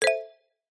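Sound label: multimedia game sound mallet slightly negative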